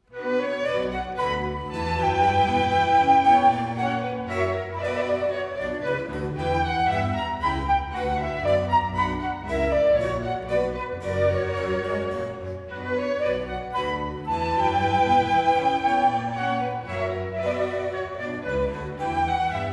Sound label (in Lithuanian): Siuita orkestrui ir solo fleitai, h-moll, Menuetas; J. S. Bach